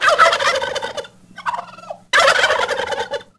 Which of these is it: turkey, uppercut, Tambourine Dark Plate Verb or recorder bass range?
turkey